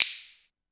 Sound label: Snapper; One-Shots; Soundtrack Loops Jazzy Chillout